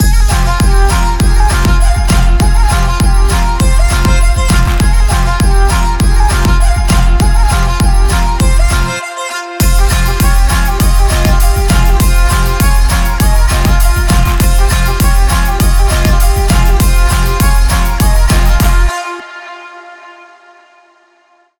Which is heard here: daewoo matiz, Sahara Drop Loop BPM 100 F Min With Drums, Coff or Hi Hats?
Sahara Drop Loop BPM 100 F Min With Drums